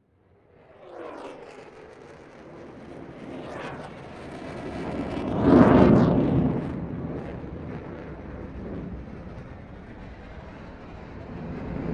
group of f 16 jet fighters passing from right to left and vanishing into the horizon; fvtt sonniss gdc audio